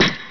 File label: ladder